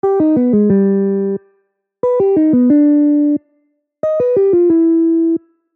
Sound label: major 2 5 1